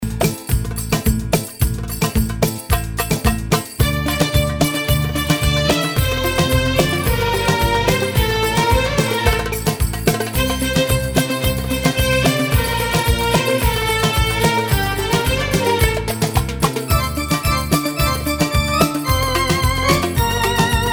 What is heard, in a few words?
Grand Solo Oriental